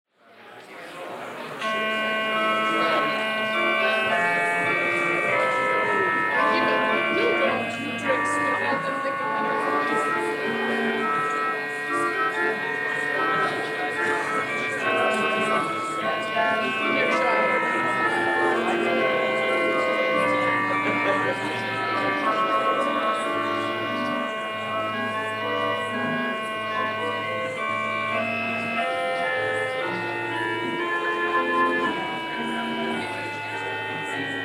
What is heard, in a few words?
Organ; MCCSF; Liturgy Of Preparation